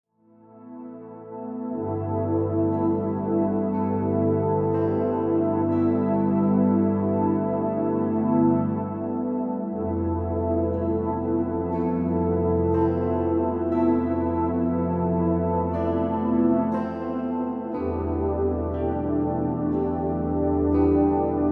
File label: Soundscape